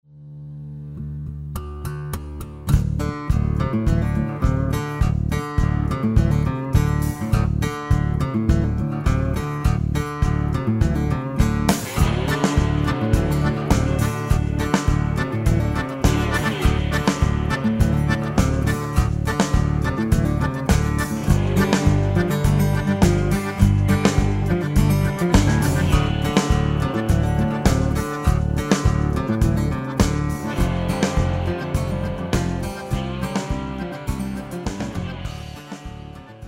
Cajun Reel

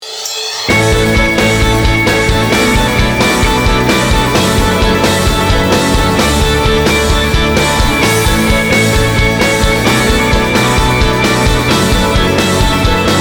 Instrumental; Disney-ABC; Fentik Music

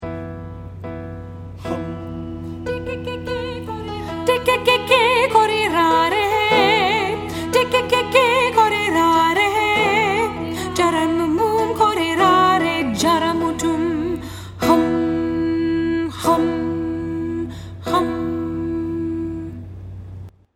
Tres Cantos Primeiro Soprano; Prince William All-County

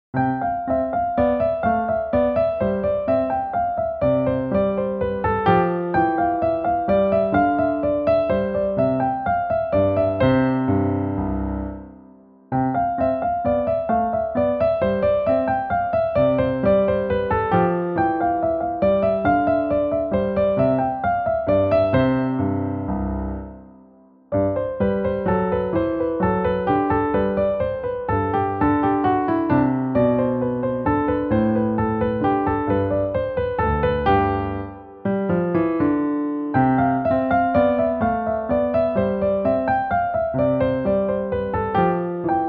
Menuet en Rondeau

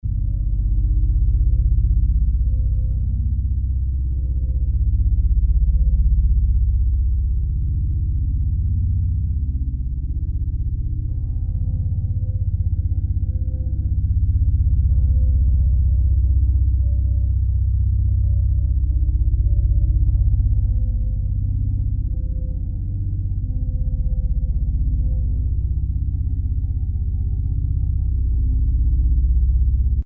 Piano Passage, 16Hz

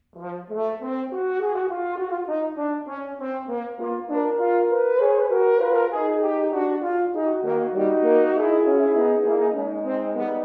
Hymn and Fuguing Tune

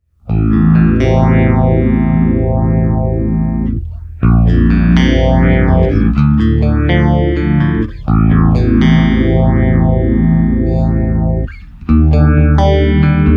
Fender Bass; EQD

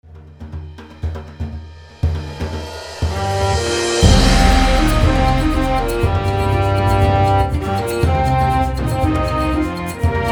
desert

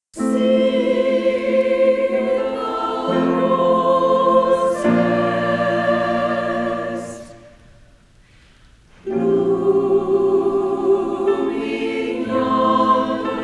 Three Hungarian Folk Songs

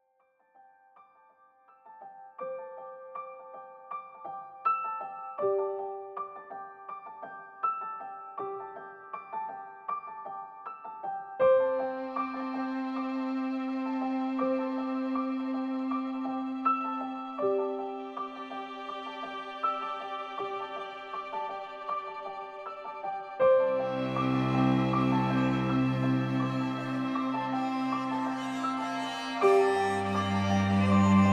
THEME PIANO VIOLIN

